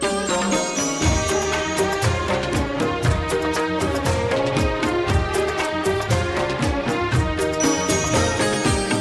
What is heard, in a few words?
Instrumental Tone